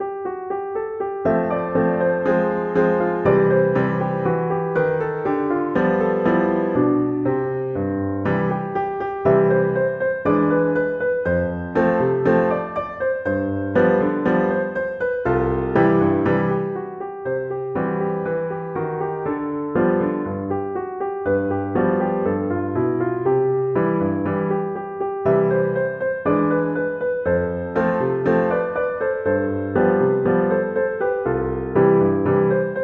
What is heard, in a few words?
PNO, piano